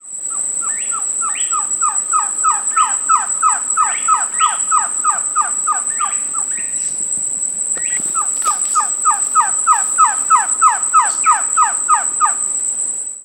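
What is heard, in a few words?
Trogon viridis, Canavieiras, Brazil; Faz Country Canavieiras BA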